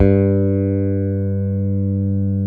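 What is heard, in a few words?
P BASS open G, pick bass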